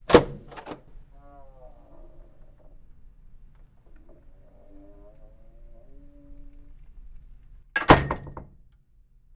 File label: Door Push Rail Wood